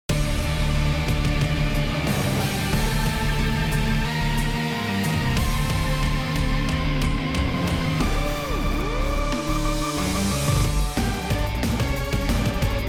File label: Battle; undead world hero survival mobile gamerip 2021; Zombie Express